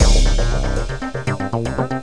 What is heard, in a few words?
Fast Drum; Cloudz 1.0.3